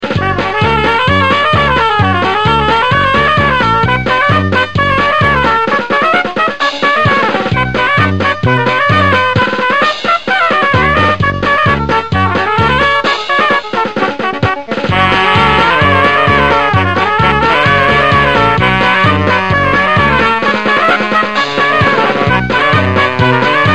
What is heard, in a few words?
klezmer